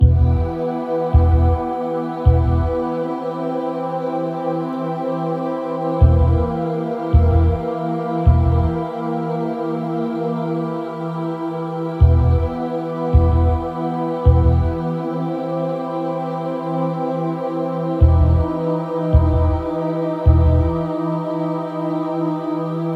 Dialogues tendu - Début; Isbarah; Windows gamerip 2015

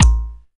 Balle Ressort

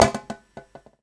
Balle Perdue

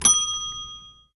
Balle Bumper note

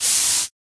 Porte Ouverture

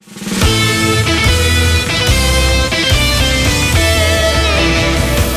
Victoire - Jingle; Isbarah; Windows gamerip 2015

